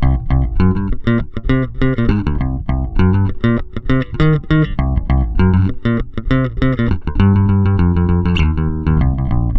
COOL JZ 100, Bass Grooves; USB Soundscan vol.30; AKAI

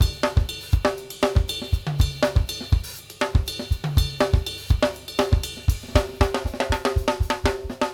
+ CYM, 120; Sampleheads; New York City Drumworks VOL-1

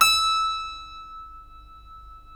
E 5 CLAV; Keyboards Acoustic; AKAI; USB Soundscan vol.17

